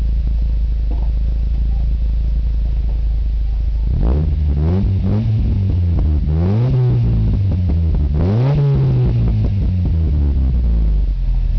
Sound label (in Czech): vyfuk